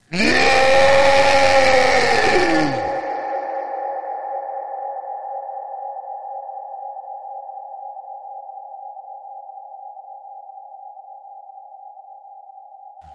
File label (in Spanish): llorar